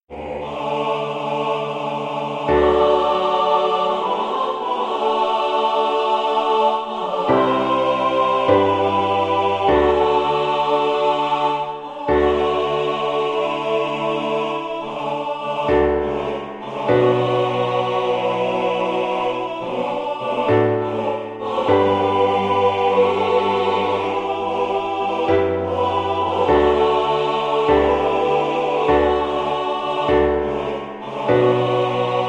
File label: MIDI, SA(A)TB